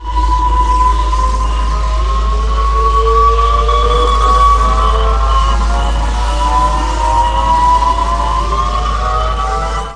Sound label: speed boost